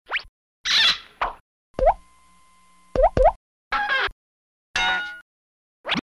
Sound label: Cartoon Various Noises